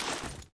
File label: pin pull start, flashbang; csgo